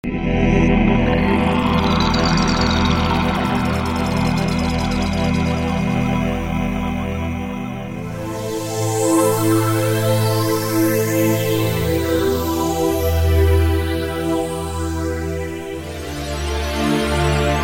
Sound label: atmospheres; rackes